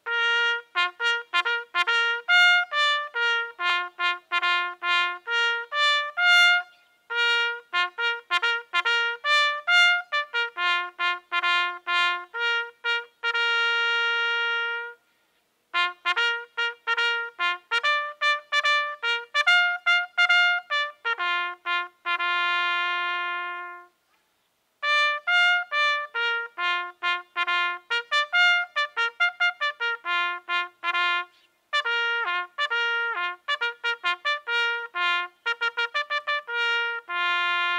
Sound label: Evening aka Tattoo, Cycling Bugle; henry keat & sons; Bugle Calls; c1880 Cycling Bugle